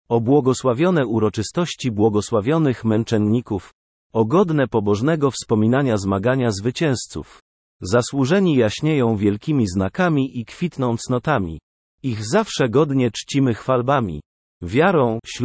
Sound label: O beata beatorum; Sequences in medieval Krakow